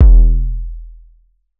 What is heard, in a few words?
808 one shot E; Slate Digital BASSHOLE Sample Pack